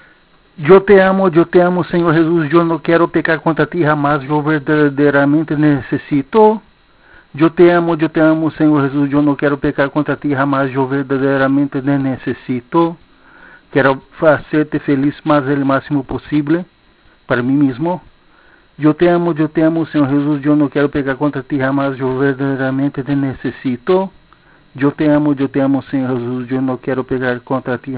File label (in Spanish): con solamente voz